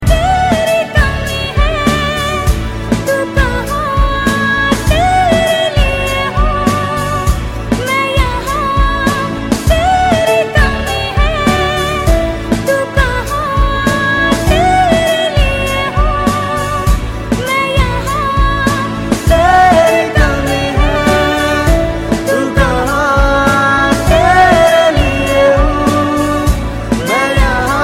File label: female